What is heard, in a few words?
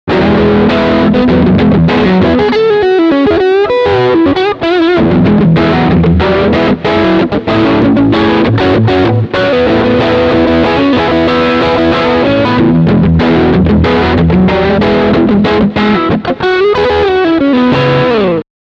crunchy blues singlecoil